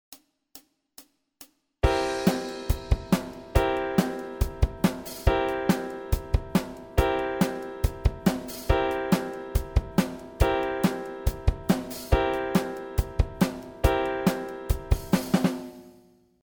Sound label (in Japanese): C7のみ